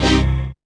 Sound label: dance chord